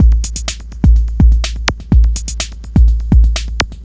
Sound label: Drums 60; Sonic Foundry (Sony Creative Software); Machine Language